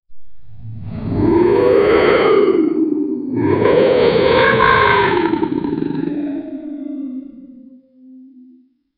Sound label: tyrannosaurus rex roar; dinosaur